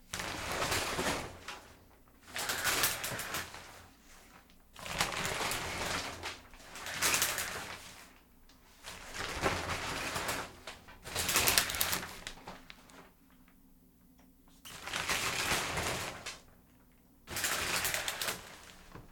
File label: Pulling Shower Curtains Shut